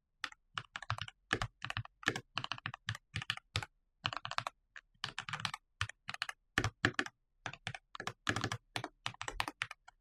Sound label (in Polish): test audio brzmienie, keychron k2 max qmk